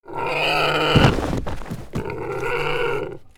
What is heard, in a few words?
death; Rust